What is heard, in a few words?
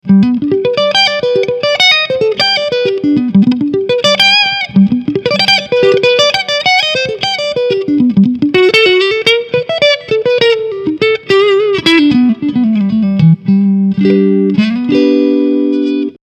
Front SC Clean Booster